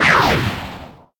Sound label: magic missile miss; wesnoth